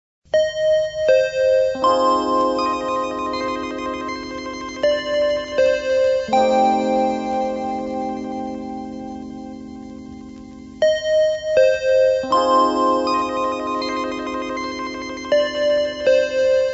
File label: interval signal; ur luhansk oblast